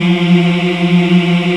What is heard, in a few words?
African Mm m; Roland LCDP11 Africa VOL-1